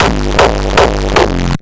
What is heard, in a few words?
Kick FX Roll, raw hardstyle kick; raw hardstyle kick pack 1